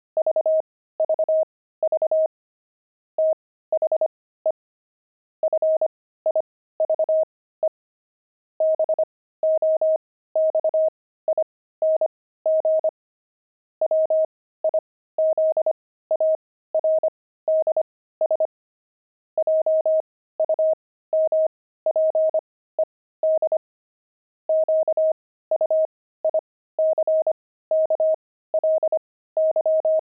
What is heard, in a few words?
pangram, intermediate pangrams files